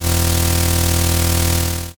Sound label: weld